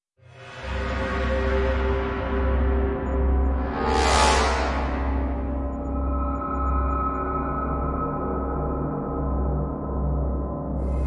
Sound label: Percussion